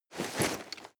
nylon leather medium short

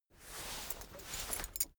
key ring small trousers pocket fetch

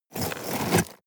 sweep small rocks short

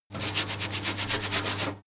mechanic sink running water brush

